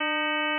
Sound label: Inh f300 Flat, 300Hz, Inharmonicity